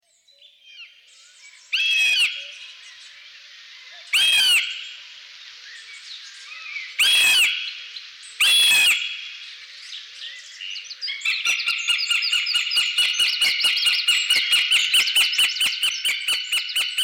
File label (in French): autour des palombes accipiter gentilis